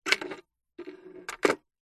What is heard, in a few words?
household telephone vintage rotary style pick up then hang up receiver gently